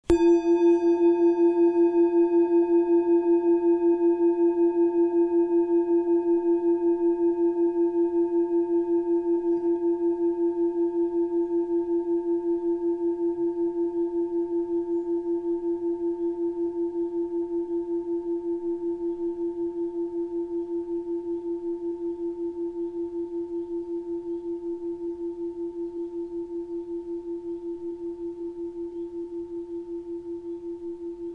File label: planet bowl; planet bowl platonisches jahr